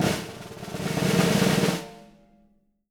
snare roll, Living Drums; Sampleheads; Peter Erskine Living Drums

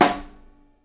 SNARE, Live Snares

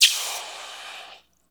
HOT METAL; ENVIRN-AMB

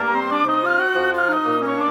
Winds, Orchestral; Sonic Foundry (Sony Creative Software) - Orchestral VOL-2

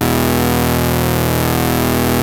ARP 2600 B1, synthesizer; Hollywood Edge - Giorgio Moroder Rare Synthesizer Collection

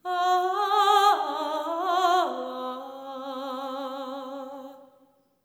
LAMENT

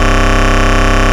rave beep C1; Best Service Alpha Dance vol.2

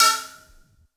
attack A3, Yamaha VP-1; Music Store; VP-ATTACK

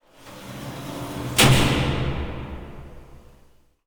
sfx sci fi; Masterbits - Switch FX

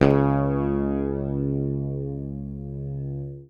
C#2, Bass Library, PICK; Sampleheads; Will Lee Bass Library

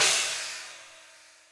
Akai XR-20, Drum Machines, Cymbals

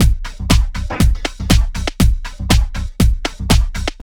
house loop

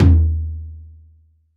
Wadaiko, Taiko, Percussion; Roland BK-5

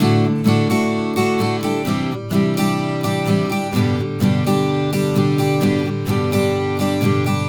Acoustic Latin Guitar 128BPM Gmaj; Vengeance - Essential Clubsounds Vol.4

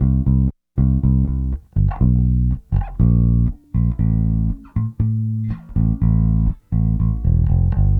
Bass, 70s Analog Funk; Sonic Foundry (Sony Creative Software); What it Is!; Classic Caddy

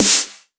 Snares; Vengeance - Essential Clubsounds Vol.4

